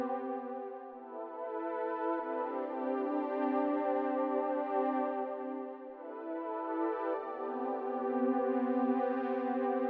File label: Chromaphone